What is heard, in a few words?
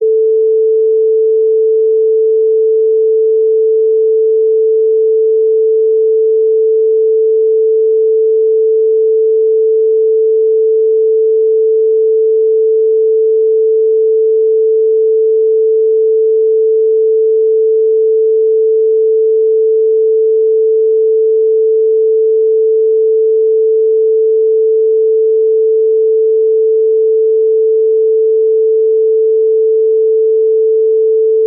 Sine 440 resynth